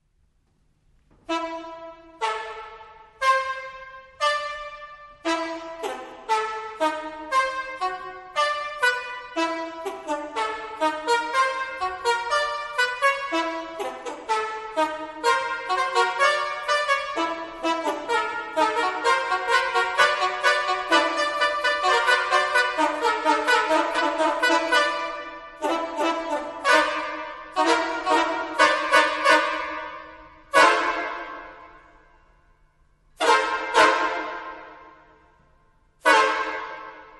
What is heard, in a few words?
carhorn prelude; ligeti